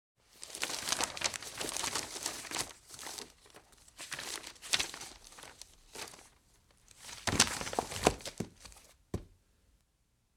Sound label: Book Pages Turning Quickly; Fairlight Sound Lib